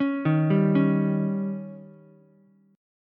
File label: D sharp 7th D sharp, Ukulele; arpeggiated; JGuitar